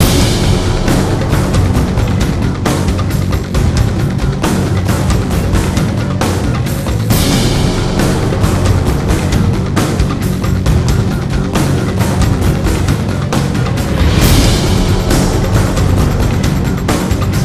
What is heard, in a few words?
RESCUE ZETA BIG BEAT, soundtrack; transformers war for cybertron gamerip